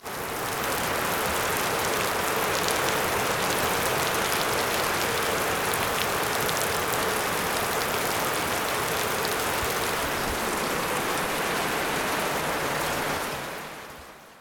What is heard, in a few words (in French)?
Pluie Minca